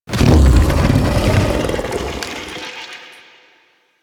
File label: Monster Door Unlock Roar; Warframe; Infested Eris; Monster Door Key